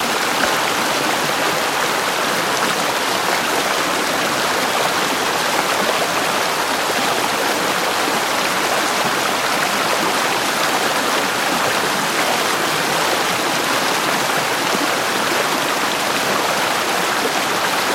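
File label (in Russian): океан обрушивающийся вниз огромным водопадом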